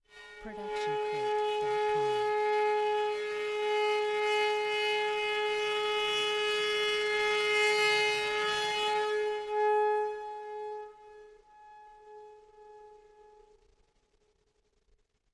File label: Horror Impossibly Long Creak; SoundsCrate